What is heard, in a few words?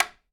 Light Switch Flip; Haunted Bloodlines; SFX Producer